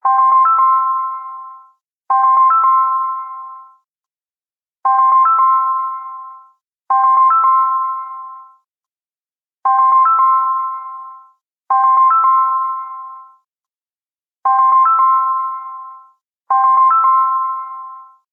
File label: jingle; Motorola Cliq